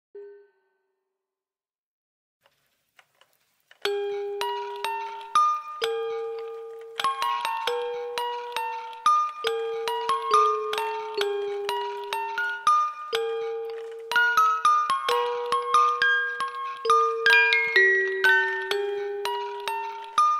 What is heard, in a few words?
Creepy music box